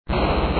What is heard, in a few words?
player Crack; battlecity